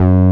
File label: TB C5, TB-303; Masterbits; Vintage Voltage